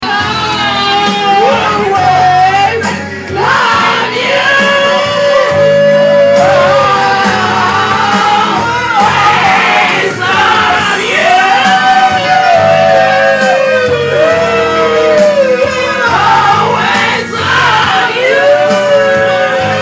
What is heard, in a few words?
Karaoke